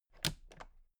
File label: toolbox latches close soft double; industrie wirtschaft; handwerk